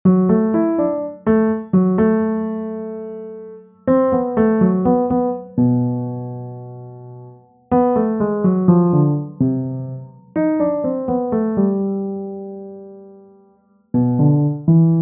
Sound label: bossa nova